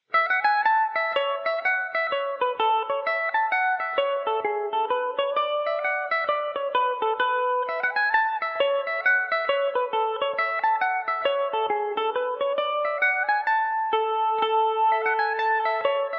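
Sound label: A major revelation, Irish mandolin; last of the twins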